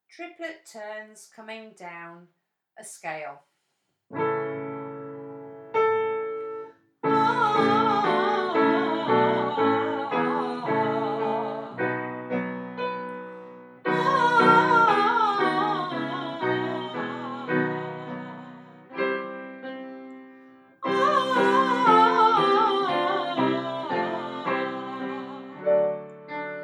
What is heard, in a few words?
triplet turns